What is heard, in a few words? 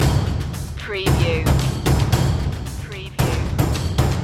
junkyard madness loop